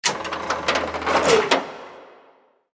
foot move; Liberty Prime

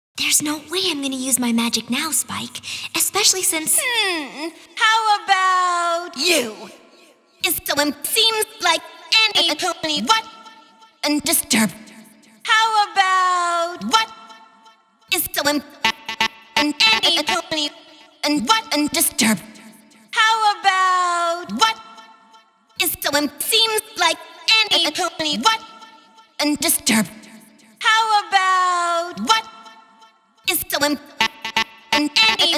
Vocals, 125BPM; Pony Fan Music Remix Stems; Trixies Good Side

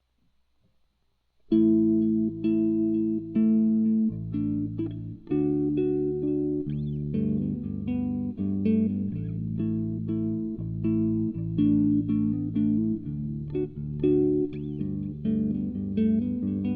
Solo bass arrangement cover

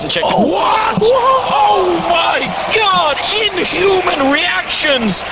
Inhuman reactions; ⁄mlp⁄ Dubs; a sounds adventure through the intranet

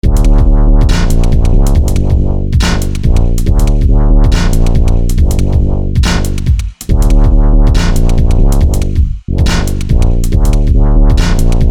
weird old school dubstep thingie

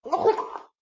Gag; Lewd SFX

